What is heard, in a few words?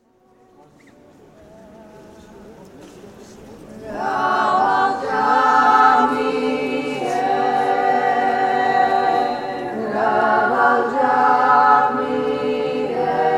female choir at Svetitskhoveli cathedral Mtskheta Georgia